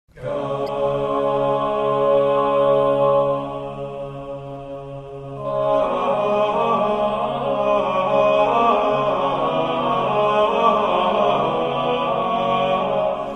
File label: organum